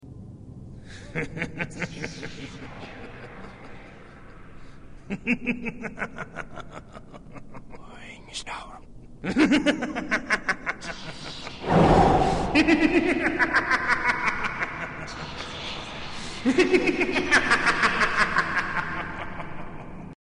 Laughing Man

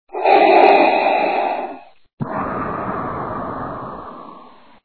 breathing; Star Wars

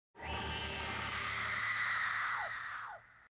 Scream

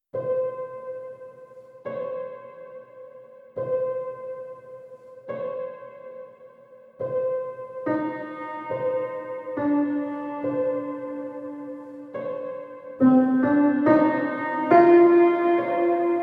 Piano; The Music of Hollywood Graveyard; The Mausoleum

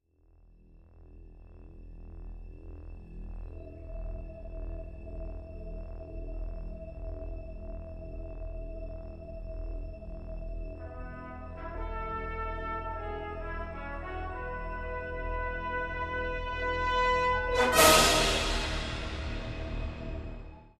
Fanfare; Star Trek

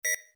Beep; Star Trek

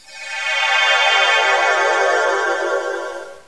Transporter; Star Trek

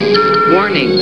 warning; Star Trek

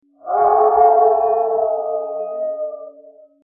werewolf howl; Halloween

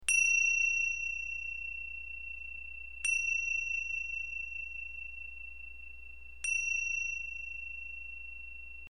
tingshas antique

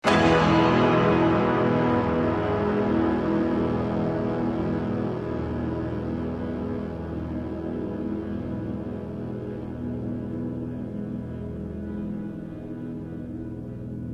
Chord

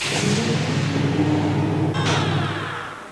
holo door; Star Trek

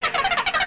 chat, Mouse Droid; Star Wars

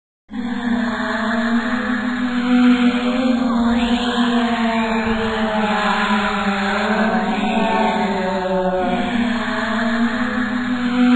Haunted Voices; Halloween

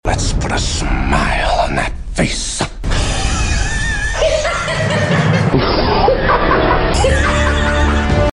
put a smile laughs

